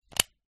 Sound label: leisure toy plastic trigger hat load into toy head; zapsplat